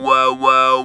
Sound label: TB WOW, Talk Box